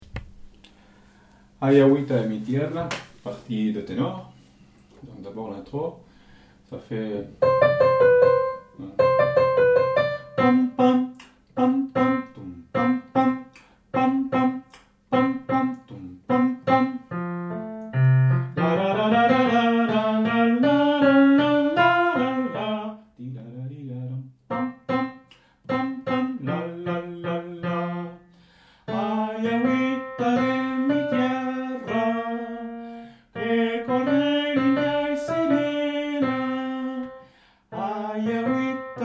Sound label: Tenor